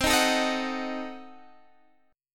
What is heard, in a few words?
F sharp Diminished 7th C